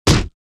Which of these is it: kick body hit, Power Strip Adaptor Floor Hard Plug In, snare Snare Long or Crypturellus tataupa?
kick body hit